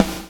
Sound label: BLOW; Spectrasonics - Backbeat